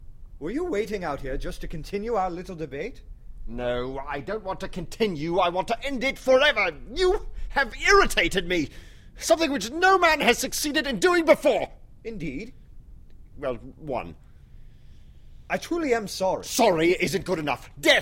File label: irritated